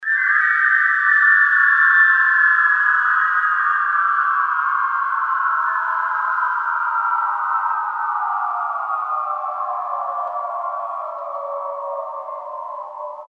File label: alien noise